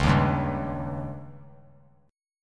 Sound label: Db Suspended 2nd Flat 5th; strummed; JGuitar